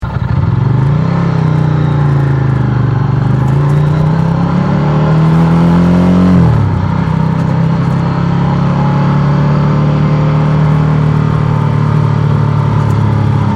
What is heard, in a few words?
ss900 termignoni